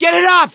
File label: scream while pounced; survivor; gambler